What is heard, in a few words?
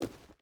footstep rubber boots forest walk; Rust